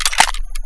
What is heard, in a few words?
clip out, weapons